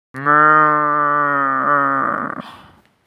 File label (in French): meuhhh